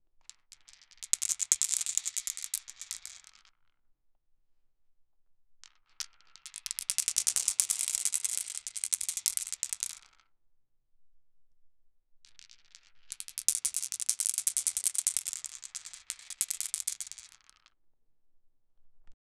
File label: board game